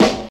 clear funk acoustic snare sample a key, Royality free snare drum sample tuned to the A note